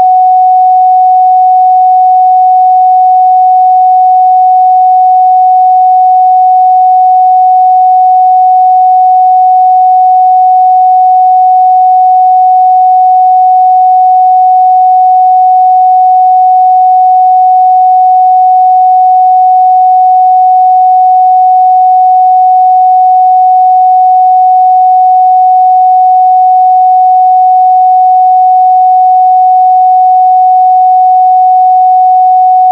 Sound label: 741hz; throatchakra; awakening intuition; Chakra Healing